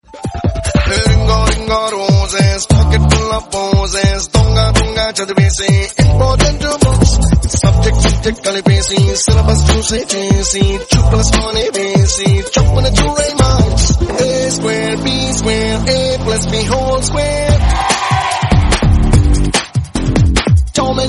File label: beat